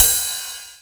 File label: cymbal, drums